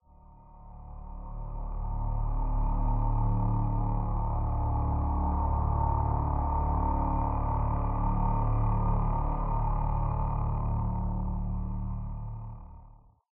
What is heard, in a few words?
Combat Pad Low